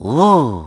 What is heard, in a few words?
oooo aaa